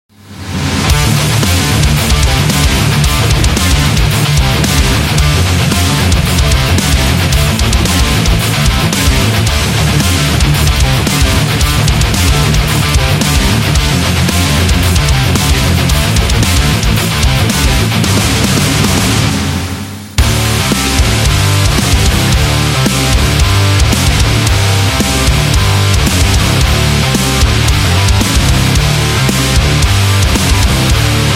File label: Metal Guitar